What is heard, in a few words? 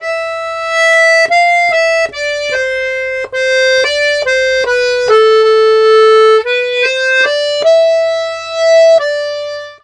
Complete Accordions; USB Soundscan vol.40; AKAI